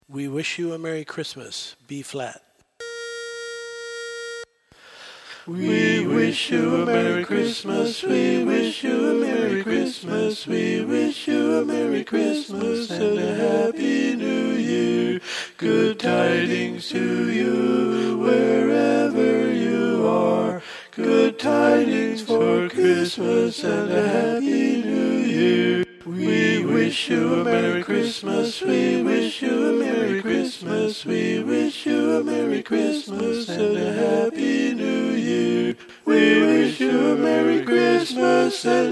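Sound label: Baritone, Learning Tracks; We Wish You a Merry Christmas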